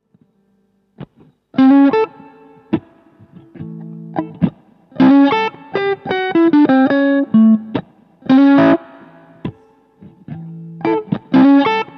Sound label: Blue Strat